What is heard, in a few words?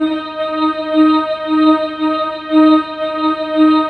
SYN D5#; Best Service Gigapack 1; SYN MOR3